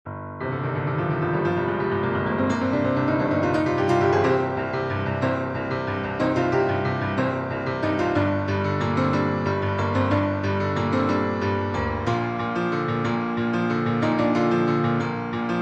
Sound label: main theme piano